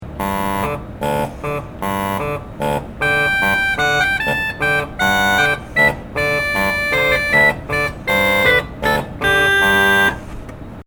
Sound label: Reed Pipes